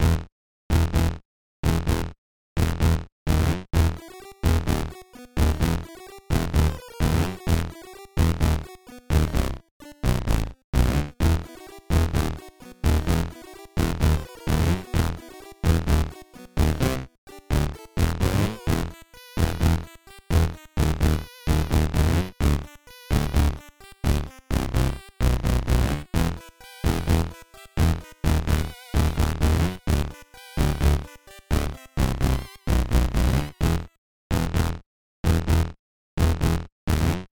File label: music kingdom latrine loop; Skellboy